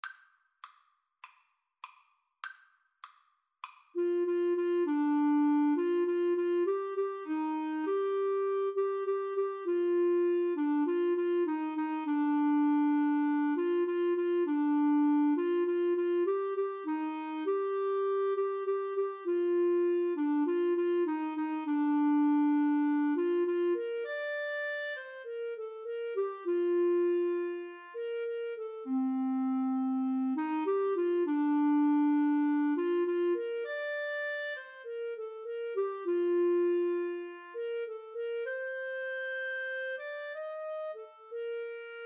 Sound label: Clarinet French Horn Duet; How Great Thou Art